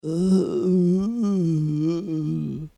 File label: Feeling Ill Bad Patient Groan; Groaning Sound Effects; human sound effect